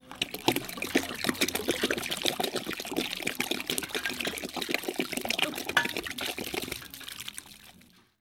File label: bottle pour